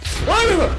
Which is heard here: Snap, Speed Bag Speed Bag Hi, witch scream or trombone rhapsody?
witch scream